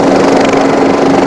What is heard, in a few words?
chopper